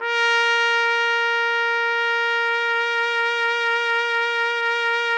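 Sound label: trumpet; Roland - LA Composer vol. 3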